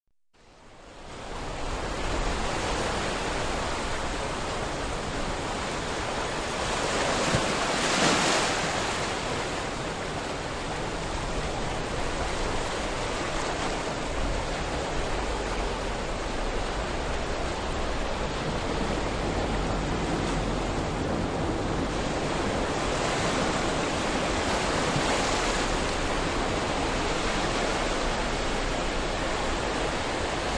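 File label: Sea roaring close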